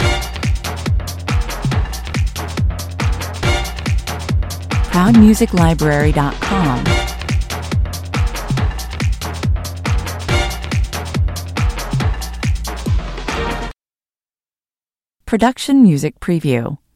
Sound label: shuffle kerfuffle (loop)